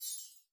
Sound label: Card Pack; gamerip